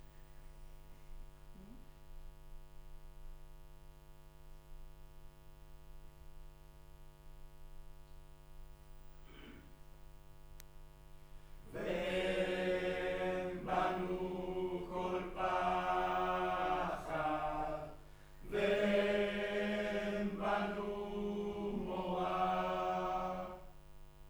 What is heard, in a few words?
Room, Choir